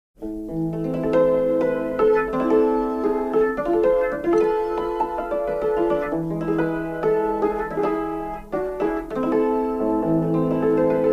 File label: tavern cymbalom